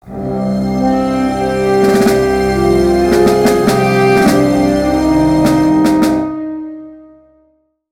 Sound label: Level Lost; Battle Worlds Kronos; ios linux ps4 switch windows xbox one gamerip 2013